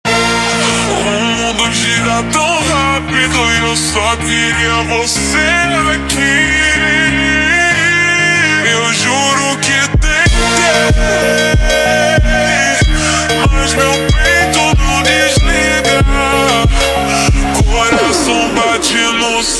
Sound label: Montagem ultra Slowed